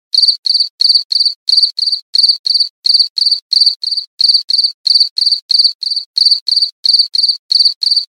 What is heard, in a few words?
Cricket Sounds